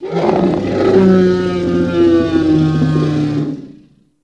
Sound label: Dinosaur Growl Moan